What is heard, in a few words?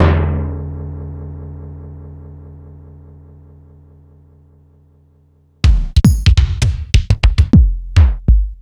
Korg 05R-W Korg Kicks